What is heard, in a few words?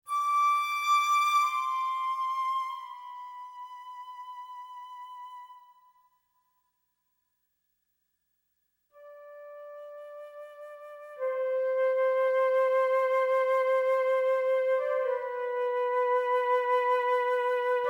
Egyptian Flute Theme; Yu-Gi-Oh! Duel Monsters